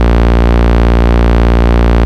Root A#, Ultimate Bass; Vengeance Samples

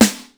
SNR, Classic drum machines; SR16; musicradar-synth-samples

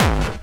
BD Distortion, Bassdrums; Vengeance Samples

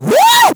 Vocal Oneshots; Vengeance Samples; Vocals

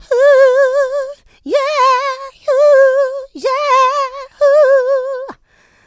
vocal house adlibs; PS-28 Vocal House Samples

